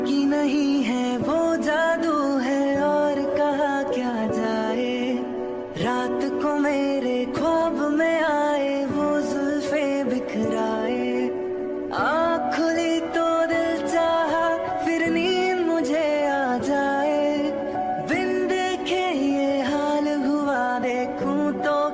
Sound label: punjabi ringtone